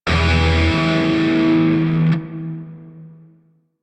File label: Shawzin Pent Maj Chord F; Lotus Shawzin; Warframe